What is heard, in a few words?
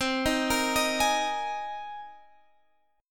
C Minor 7th Sharp 5th, Guitar, Half Step Down